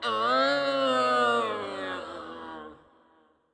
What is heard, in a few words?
Toxic Mask Death